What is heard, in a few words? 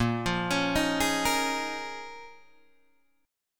Bb Minor 11th Bb down Guitar Open D